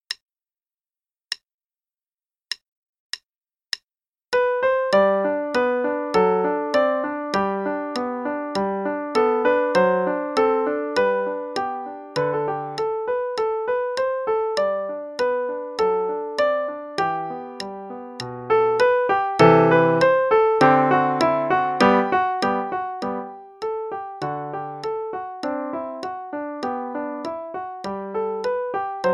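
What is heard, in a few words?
Play-along, Sonatina in G, quarter note=100; Atwood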